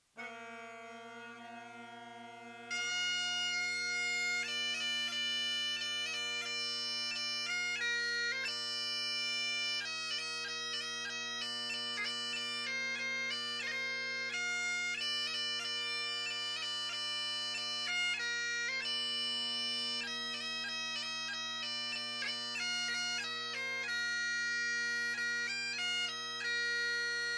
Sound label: Jingle Bells, bagpipes